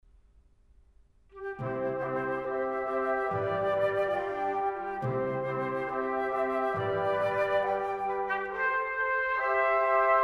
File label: Divertimento in C, Allegro; Mozart; Serenades and Divertimenti for Wind